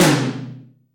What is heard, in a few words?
TOM